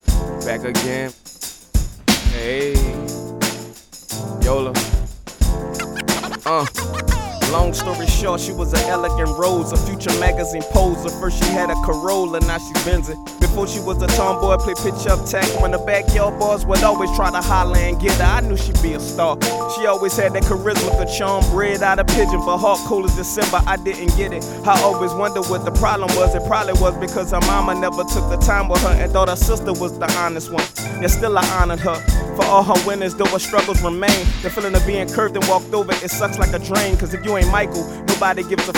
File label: hip hop before